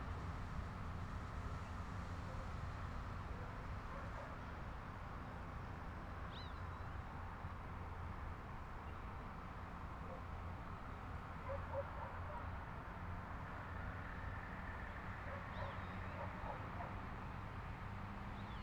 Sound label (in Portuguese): Ambiente rua da Ceilandia com transito e rumble de cidade; ambiencia urbana; Coleção Sonora do Cerrado